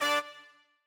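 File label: trumpet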